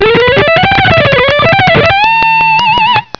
Lead; Cool Licks

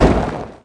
Gravel; TrickStyle; BHGravel

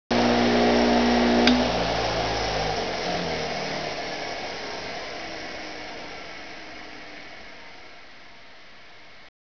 machine

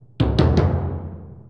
D+B 160; eJay

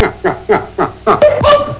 nyuk; 11,025 sample rate; Amiga 8-bit Sampled Voice

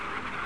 thrust; LANDER

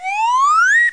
slide; McDonaldland - Silly Games; McDonald's; treasure

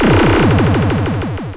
zapper

